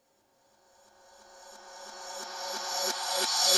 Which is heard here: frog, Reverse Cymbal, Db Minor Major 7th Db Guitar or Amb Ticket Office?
Reverse Cymbal